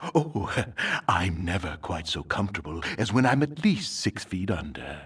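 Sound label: female dying; nwnds audio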